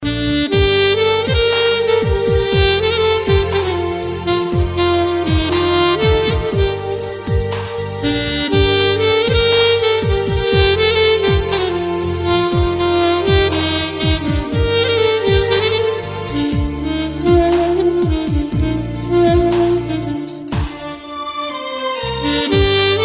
Arabic Love

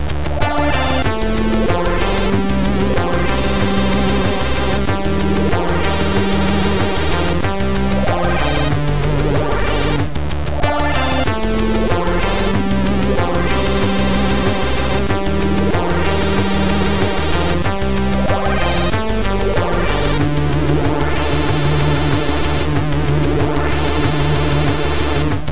8bit

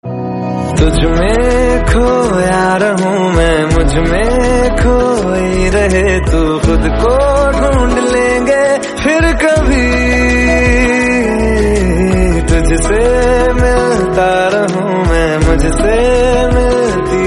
hindi ringtone

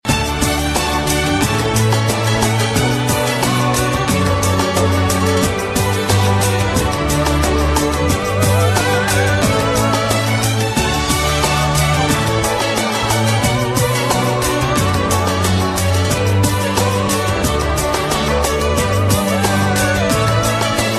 tune

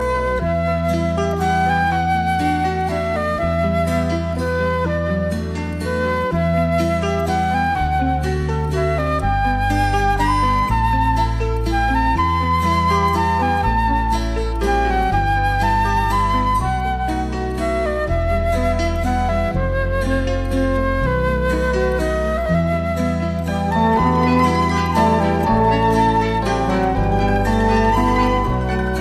elegant flute; Electronica

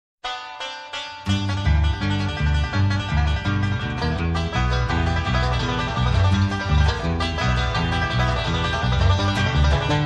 banjo pickin; Country

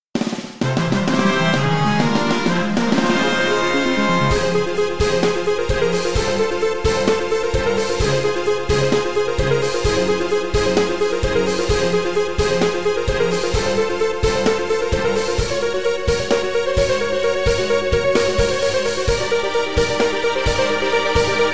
victory fanfare; Final Fantasy VII

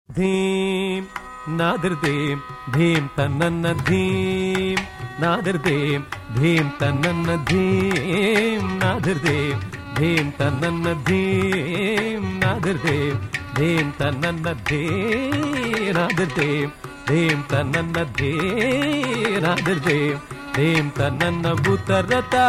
classical

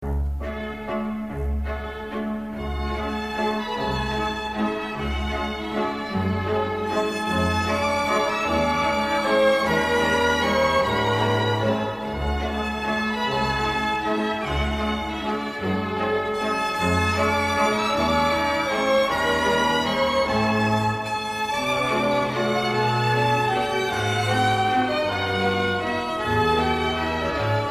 bridal waltz

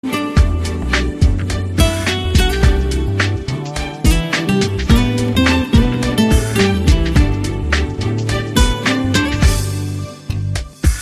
cool tone

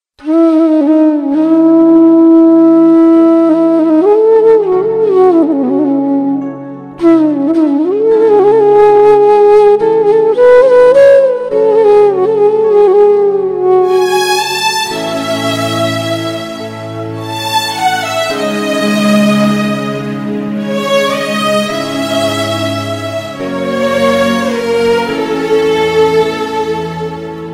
rabindra sangeet flute; Electronica

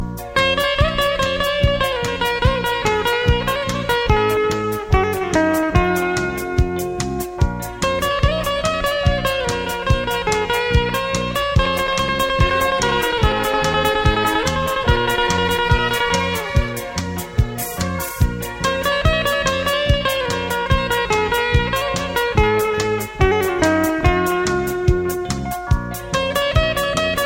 Romantic Guitar Melody; Electronica

